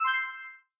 earn Energy

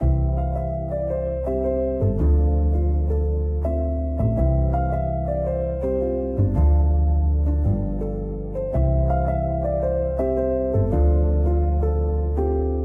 music Kitchen

